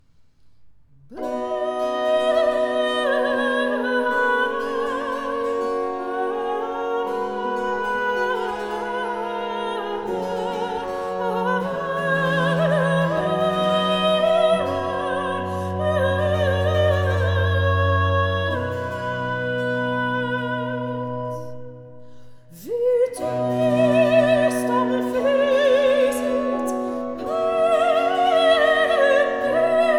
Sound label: Orchestre Symphonique